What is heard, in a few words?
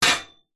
metal frame short sharp bash hit; foley